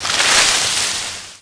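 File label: plant rustle, impact; SINDEMO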